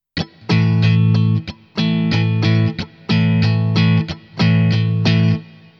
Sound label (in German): Schlagmuster Übung, gitarre